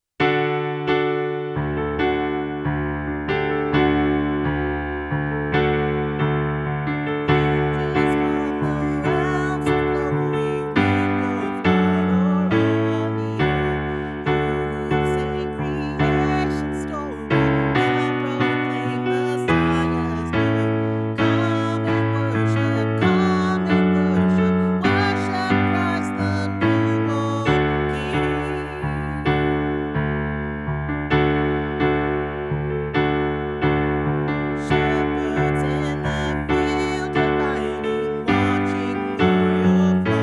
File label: Sop w Lead, christmas choir